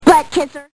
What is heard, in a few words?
kisser